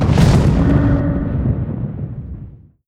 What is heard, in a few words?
skewer cast; weapons; dota; magnataur